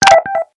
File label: Sms Ringtone; Life is Strange